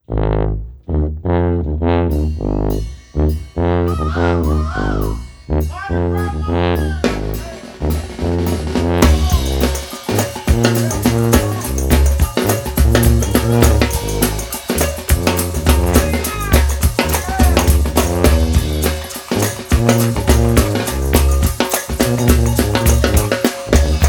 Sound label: brass band steaming blues